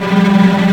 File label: TREMO STR G2; TREMOLO STR MIX; Strings